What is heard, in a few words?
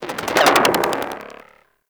Electronic Gust; Ambience; Warframe; Corpus Railjack